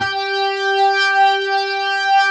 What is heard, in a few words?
G5, OCT DIST ORG; Sonic Reality; Vintage Timetraveler